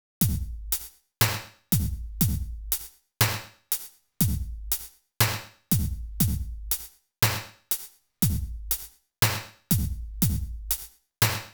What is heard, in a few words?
drum sample added reverb